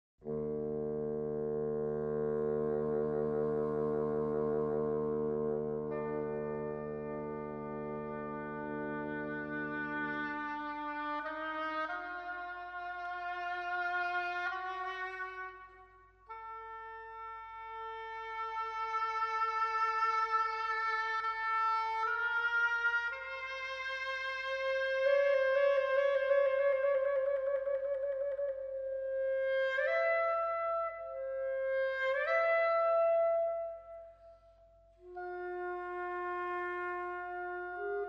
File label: oboe, clarinet and bassoon